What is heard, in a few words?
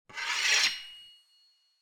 Draw Sword; soundscrate